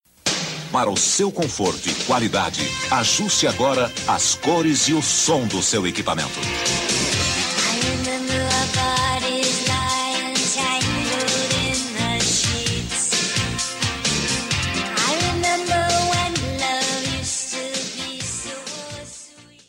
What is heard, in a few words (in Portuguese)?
vinheta; abril video